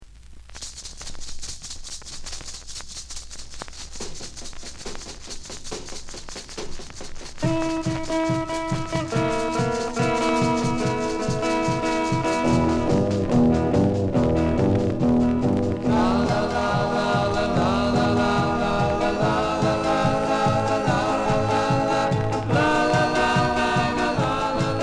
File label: bell notes